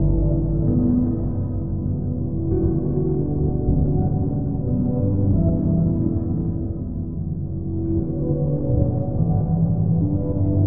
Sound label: Piano Ambiance Loop 90bpm